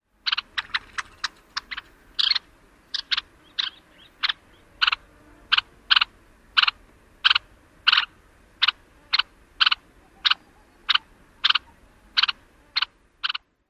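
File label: kett calls and rattles; AK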